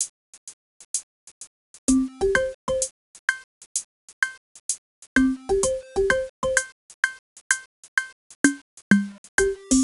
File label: Game Countdown; 2009 nds gamerip; Spanisch Buddy